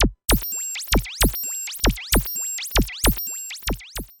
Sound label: work cute, anime